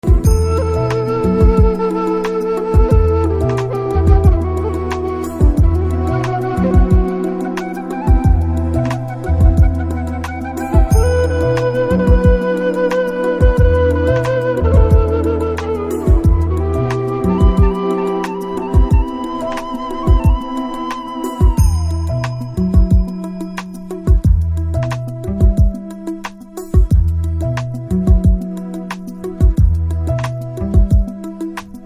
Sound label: BGM Ringtone